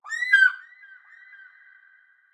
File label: ambience good bird; env s8conquest; smite